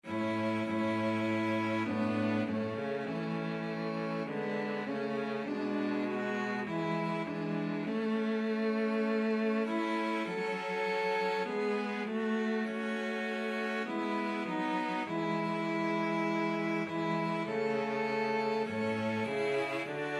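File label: it is well violin 2 violoncello, string trio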